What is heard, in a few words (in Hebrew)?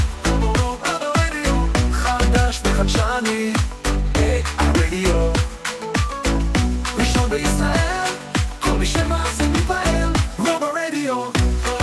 גינגל